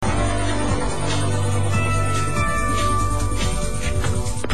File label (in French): Radio Trafic FM virgule